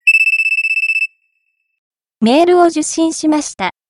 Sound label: ring mail